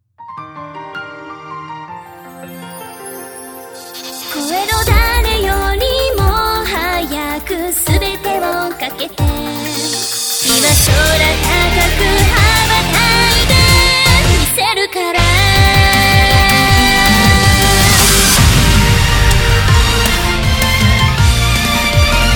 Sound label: Pops